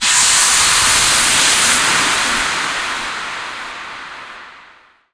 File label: TECHNO FX 140